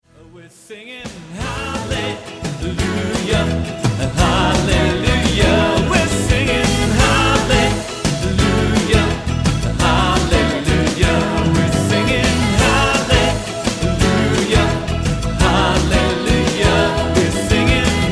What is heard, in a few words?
clap your hands; let it flow like a river